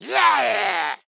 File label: Combat